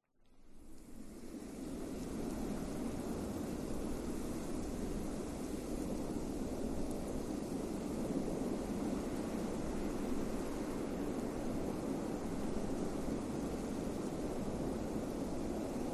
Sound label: snowstorm ambience snow falling on ground with wind; silverplatter audio